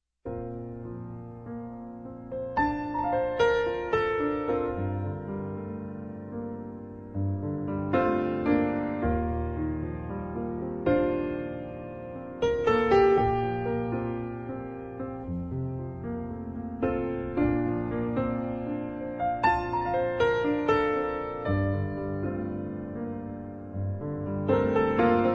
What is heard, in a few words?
Naked Piano; San Gimignano